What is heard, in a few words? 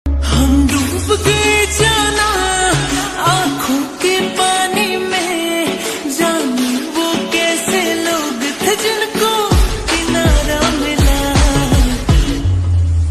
hindi ringtone, hindi love story ringtone, hindi love ringtone